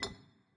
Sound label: ambience bottles; dead or alive 2